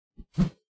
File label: melee attack swoosh heavy, weapon swooshes; psfx